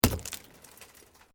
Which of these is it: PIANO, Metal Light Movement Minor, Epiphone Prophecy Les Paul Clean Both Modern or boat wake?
Metal Light Movement Minor